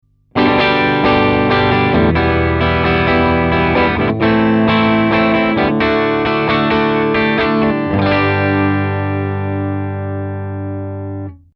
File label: Strat Am Pro strum puente